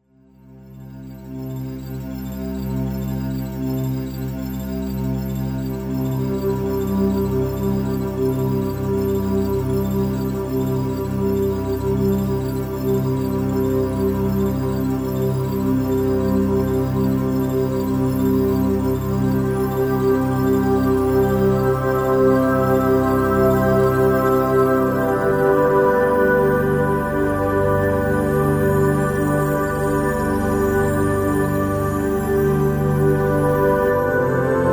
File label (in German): Entspannungsmusik für Babys